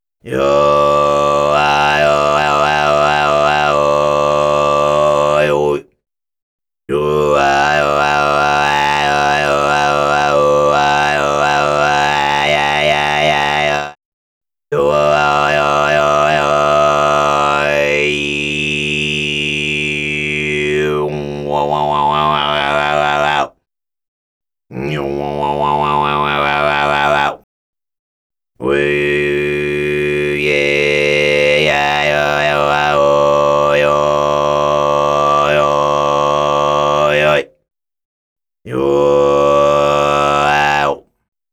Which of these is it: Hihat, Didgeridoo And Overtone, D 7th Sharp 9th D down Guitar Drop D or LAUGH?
Didgeridoo And Overtone